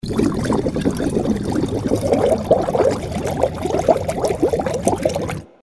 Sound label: Turbo Swim Bubble